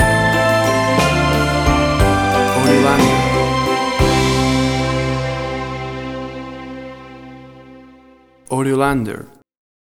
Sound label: Dating in the 50s Stinger